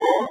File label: Science Fiction Sci-Fi Electronic Terminal Activated